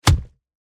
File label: PUNCH SMACK LETTUCE WITH CONTACT MIC ENHANCED; MKH8040; PMSFX; Lethal Blow